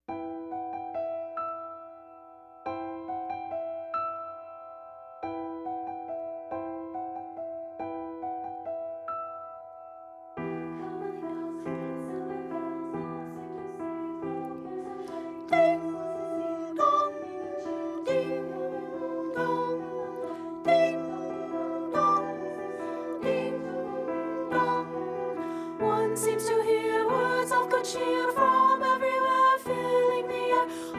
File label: CAROL OF THE BELLS SOP